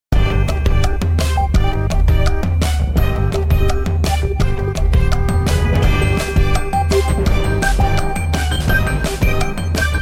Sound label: In Game Theme (10 Second Countdown); Kahoot